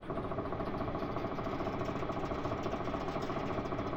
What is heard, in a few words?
rudder left, aircraft; DCS